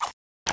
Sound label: spin; brainbread